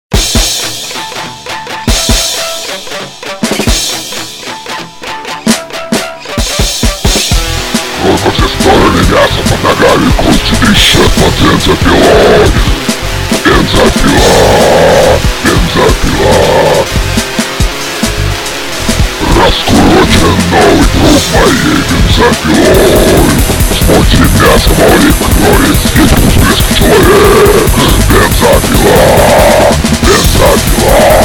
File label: Chain Saw Guitar vocal